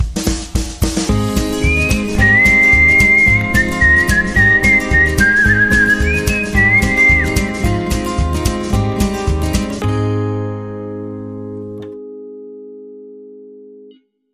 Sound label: Whistling